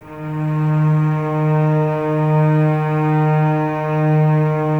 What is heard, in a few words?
CES D#3, Cellos No Vib, String Ensembles; Miroslav Vitous